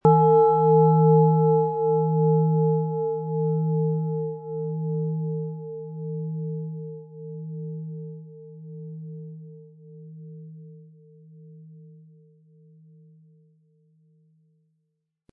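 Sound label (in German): Planetenschale Alphawellen & Mond, Ø 16 cm; Innere Tiefe fühlen & Sich selbst helfen können; 500-600 Gramm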